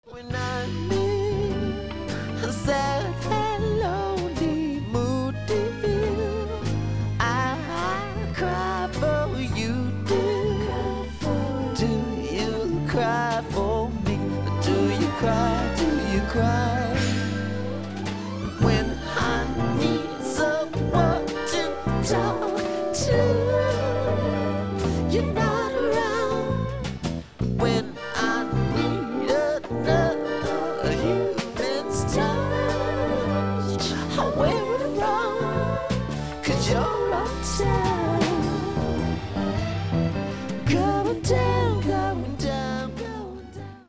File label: vocal